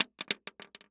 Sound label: Weapon Bullet Shell 3030 Shell Cases Multiple Cases Fall On Wood Floor; AdobeCC